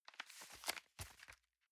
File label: envelope swap soft